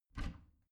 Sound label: bathroom cabinet warped open soft; objekte; tueren